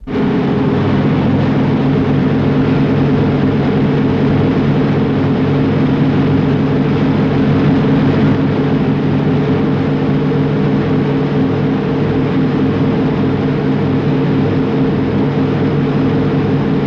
B29 Bomber Constant D, b 29 superfortress

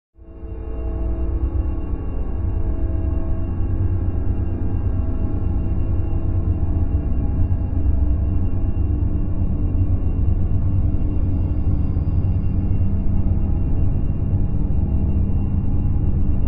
Bed Foreboding Drone

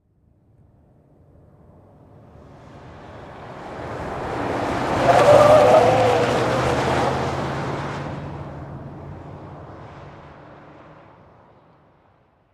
Expedition Fast Corn, ford expedition

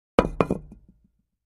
Cinder Block Two Slam

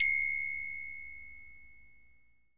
Bell Chime Store Doo, doorbells

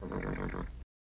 Horse General Snort

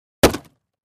Axe Fire Log, axe hits

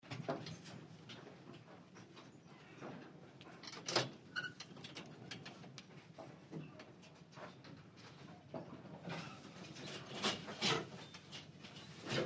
Cow Barn BG Clanking S, cows

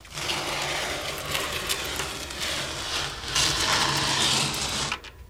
Bike Tire Drag Rub On C

